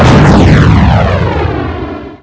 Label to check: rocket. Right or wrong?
Right